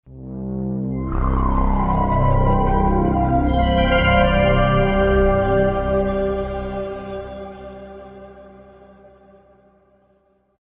Nhac nen gioi thieu kinh di ngan

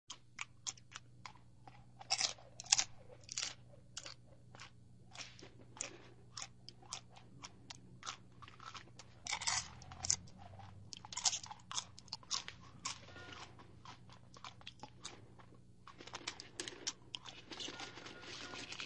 Tieng nhai an bong ngo

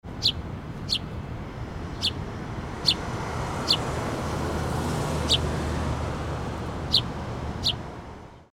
chim hot trong cong vien thanh pho

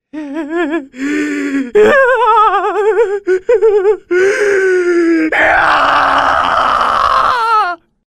tieng nguoi dan ong khoc gao thet tuyet vong

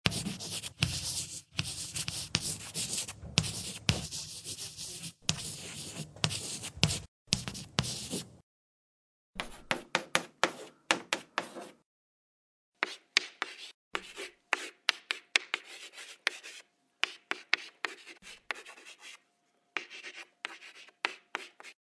Tieng viet phan tren bang den

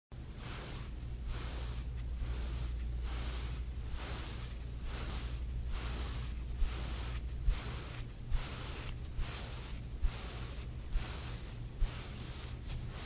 tieng vuot long cho meo tieng vuot ve dong vat

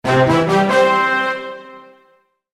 Nhac nen nay ra y tuong

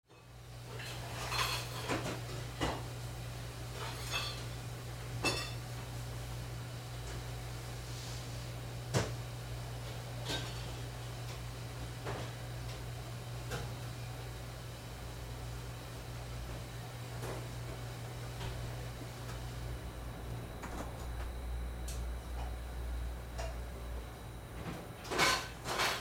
Tieng xoong chao keu trong bep nha hang

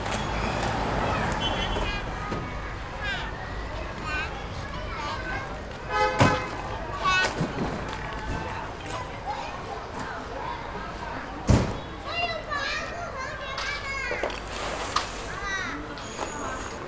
tieng on ao o truong hoc mau giao

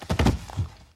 co the roi xuong sich

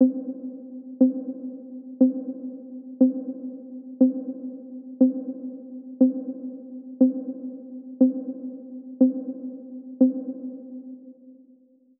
Am thanh dem nguoc choi tro choi

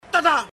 Am thanh tada meme

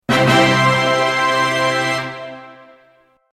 Âm thanh ta da của dàn nhạc

